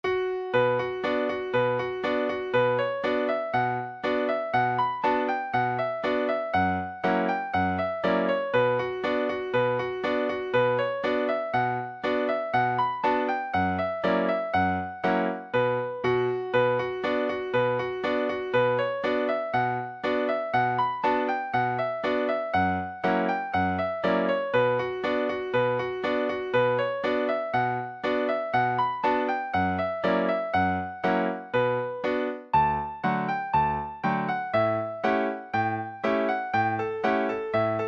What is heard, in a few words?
drums and guns, session tune